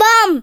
Bam

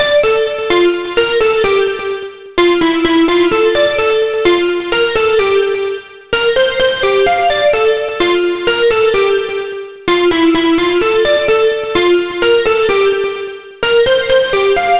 Piano Melody Beat Loops

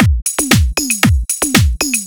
All BPM Style Dholki Beat

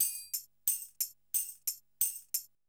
Gabra Dholki Loops

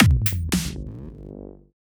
Dholki Beat

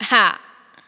Haaaaa

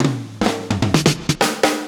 Electro Fills

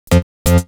Bassline

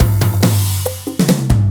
Electro Fills; Electro Fills Packs

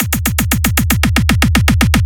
Dholki Beat

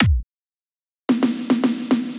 Dholki Beat

